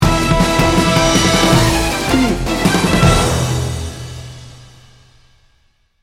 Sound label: war games sting bumper